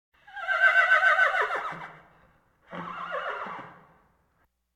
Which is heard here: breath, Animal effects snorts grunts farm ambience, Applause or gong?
Animal effects snorts grunts farm ambience